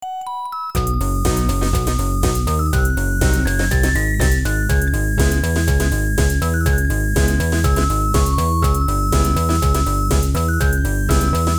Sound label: Press Start; Pilot Kids; arcade